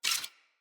Secondary Dagger Un Equip, Swords; Weapons; Player

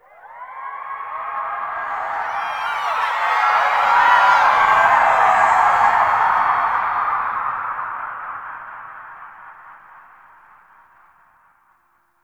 Echoes, Ambience; Lunaro; Warframe; The New War; Zariman

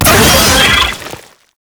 Pain, Vocals; Enemies; Warframe; Proto Infested; Brachiolyst

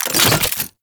Alchemy Grenade Drop; Entrati

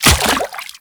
Step Water, Footsteps

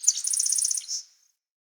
Wetland Forest Day Insect Close; Midrath Island; Ambience

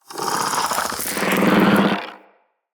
Spider Elite Vocal Chatter; Root Spider Elite

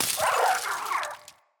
Freeze Mote Vocal; UI; Motes

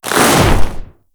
Ice Swing, Hammer; Warframe; Grineer Hammer Boss

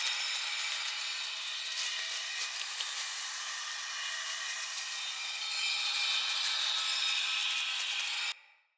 Energy Restore Butterfly Grace Loop

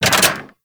Saw Blade Launcher Fully Charged; Warframe

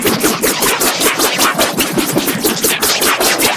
Puncture Glaive Loop

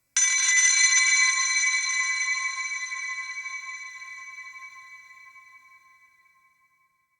High Bell; Warframe; Clan Dojo; Dojo Randoms; Ambience

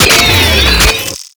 Screen Glitch On; Orbital Station; Warframe; Tau

